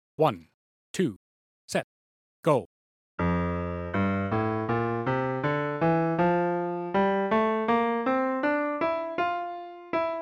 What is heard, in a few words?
C 2 octave low 80bpm, two octave scales